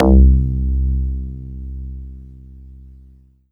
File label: Casio VZ-10M Quickbass C2